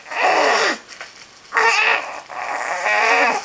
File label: angry cats